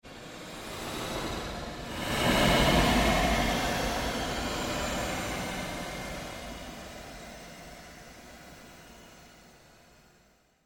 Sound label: Created Surge Long Sweep, Sweeper Sound Effects; Sound effects created by manipulating other sounds